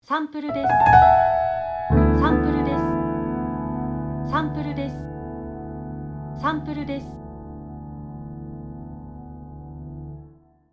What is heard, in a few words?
Piano